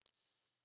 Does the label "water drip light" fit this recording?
Yes